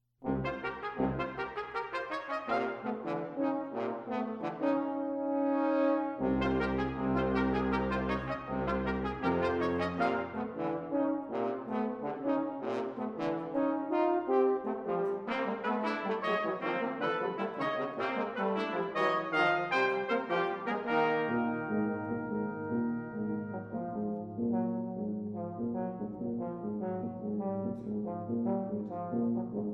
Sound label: Brass Quintet, Allegro